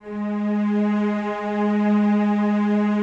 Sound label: Cellos, String Ensembles; G#3; Miroslav Vitous; LINKS ORCH